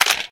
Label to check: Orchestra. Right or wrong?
Wrong